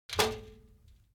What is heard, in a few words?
object office trash basket metal hit rattle; smartsoundfx